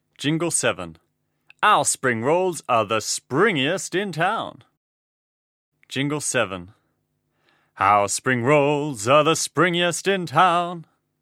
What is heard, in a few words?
Jingle; poems and songs